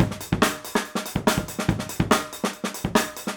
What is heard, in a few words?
break, loops